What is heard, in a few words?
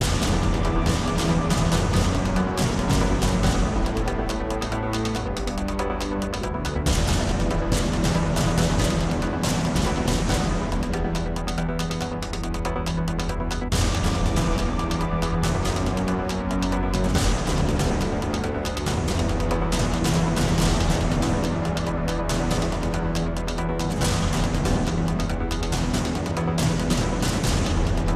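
Music Battle Loop; Vortex Ridge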